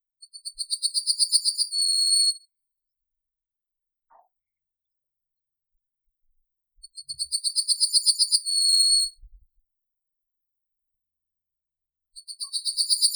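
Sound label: yellowhammer, Emberiza citrinella; ovsyanka obiknovennaya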